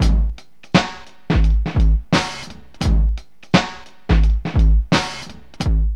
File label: FUNK BUCKET 86, Funky Elements loops; Zero-G